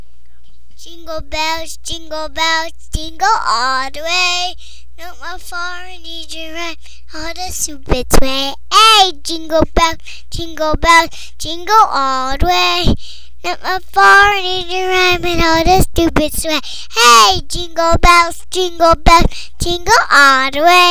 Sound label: jinglebells